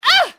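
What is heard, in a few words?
grunt, female, pain death; fmale; Soldier Of Fortune II Double Helix; XBOX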